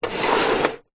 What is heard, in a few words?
household tin empty slide table; zapsplat